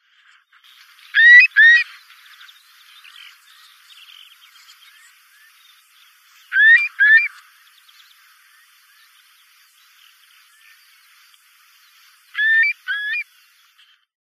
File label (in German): grosser brachvogel